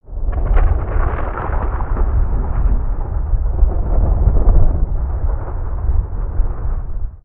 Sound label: Bridge Rumble